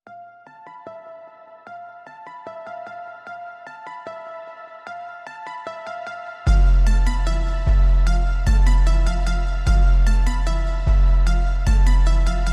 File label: No Perc